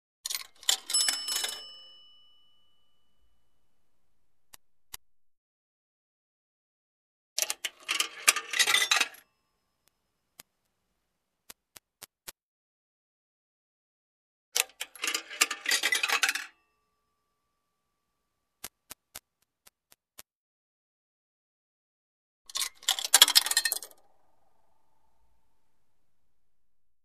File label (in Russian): telefon